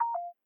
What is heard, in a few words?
Low Battery